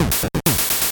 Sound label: 8bit se